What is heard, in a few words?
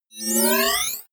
screen turn on whoosh; energie